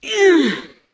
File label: Wound Medium, Creature; Eydis